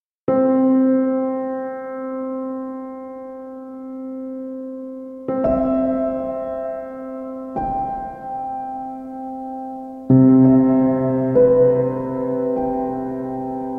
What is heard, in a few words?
Piano Sounds